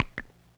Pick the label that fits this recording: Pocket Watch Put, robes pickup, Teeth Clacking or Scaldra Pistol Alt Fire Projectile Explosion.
Teeth Clacking